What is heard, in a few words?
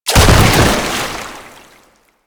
Proto Infested Bodyfall Water; Enemies; Warframe